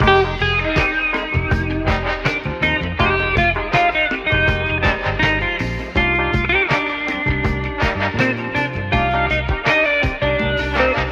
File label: guitar, Loops; Phonky